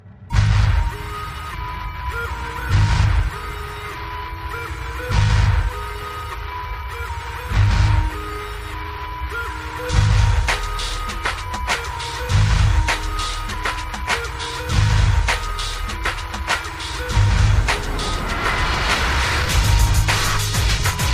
Techno Mix

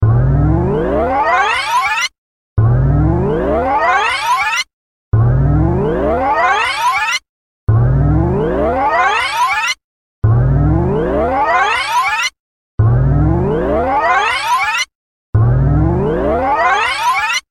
Tape Rewind Sound

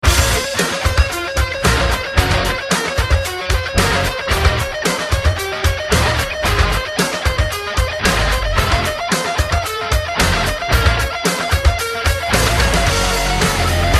Rock Guitar

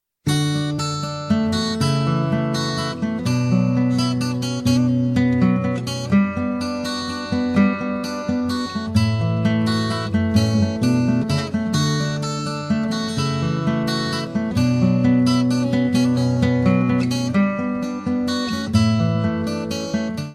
WALTZ; ALICE

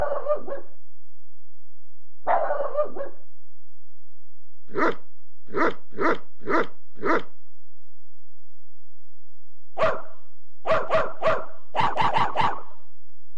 Dogs

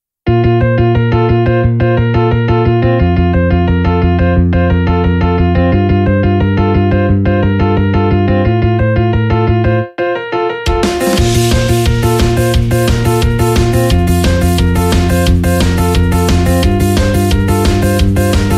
Vocaloid Ringtone

